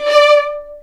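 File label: D5 marcato double bass, Arco; East West; The Ultimate String Collection; VLNS ARCO DB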